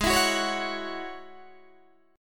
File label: Ab 7th Suspended 4th Sharp 5th Ab down Guitar Open D